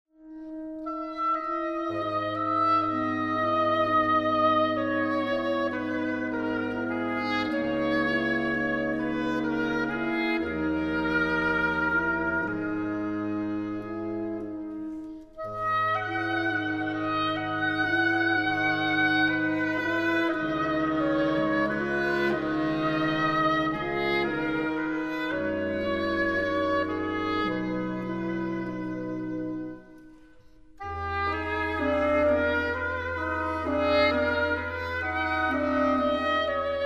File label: barber summer music